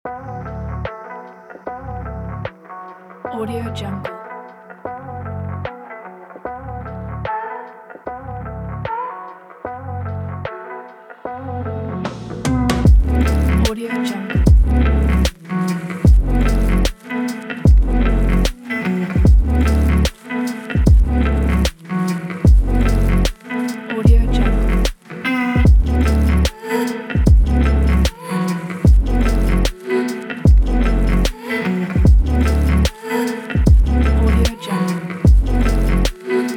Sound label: sexy beat fashion